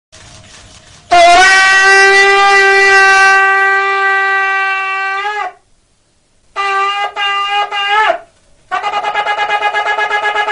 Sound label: playing Shofar; Rabbi; Mordekhai Chriqui